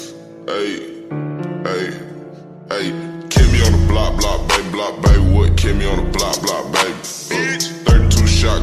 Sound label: slowed remix